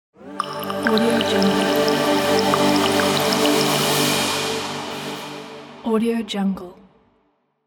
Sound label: water logo